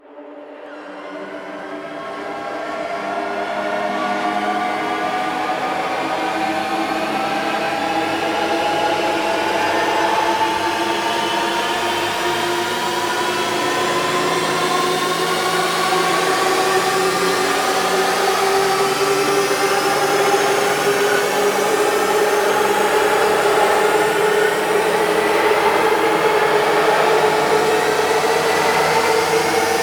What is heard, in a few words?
riser Intro; Monitor